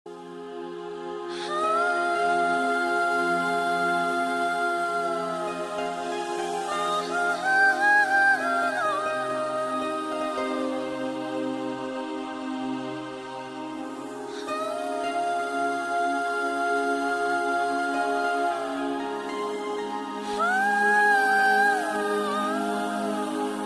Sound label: Humming